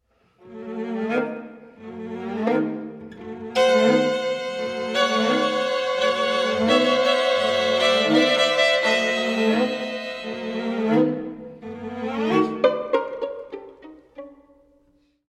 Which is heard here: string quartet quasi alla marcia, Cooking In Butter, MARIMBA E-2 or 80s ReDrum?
string quartet quasi alla marcia